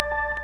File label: Default Beep; Lighthouse; Christian Desktops for Win98